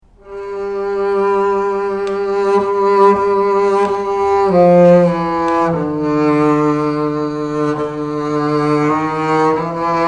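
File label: antique double bass sound sample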